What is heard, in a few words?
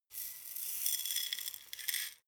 herbs tilt slow; soundpool